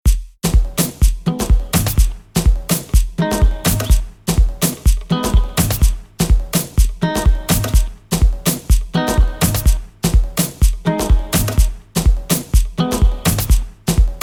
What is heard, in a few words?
Guitar